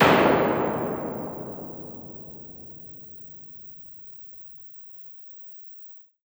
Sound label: EMT 140 dark, Plate; IR